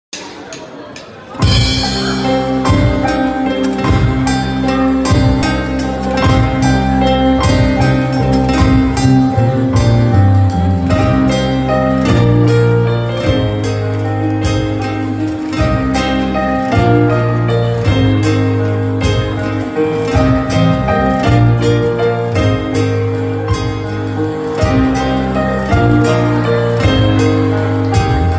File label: gitar